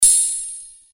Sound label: Sword Sound; FXHome; Fighting Sounds